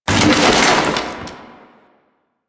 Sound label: chair destroy; ambience; Warframe; Entrati